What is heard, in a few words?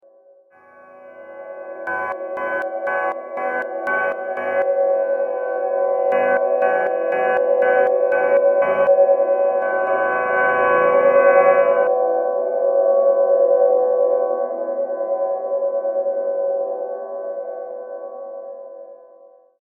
Step Mod